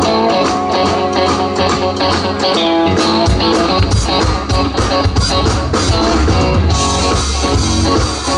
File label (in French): break batterie